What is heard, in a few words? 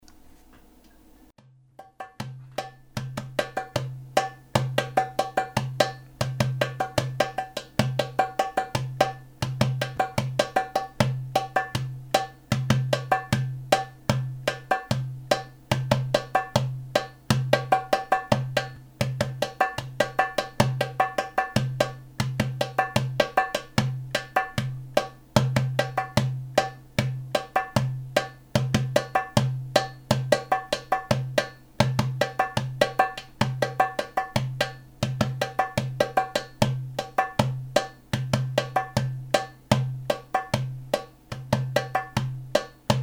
middle asia rhythm